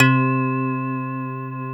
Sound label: KEY, Drum & Bass, 135 BPM; Ueberschall; Drum & Bass Resonance